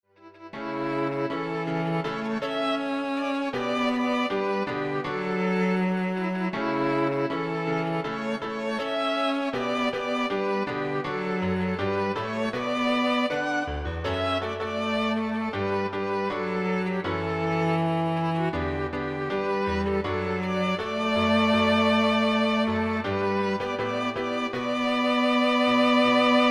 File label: Piano Trio